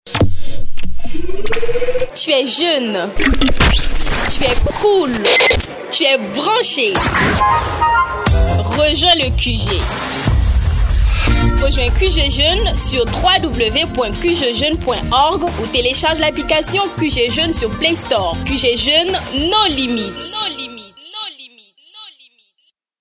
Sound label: jingle; QG JEUNES